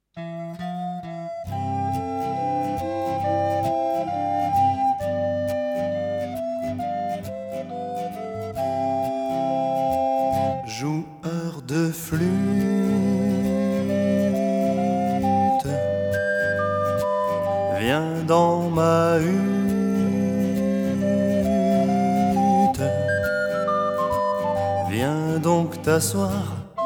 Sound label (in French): Joueur De Flute